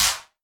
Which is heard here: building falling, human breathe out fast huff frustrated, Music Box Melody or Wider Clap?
Wider Clap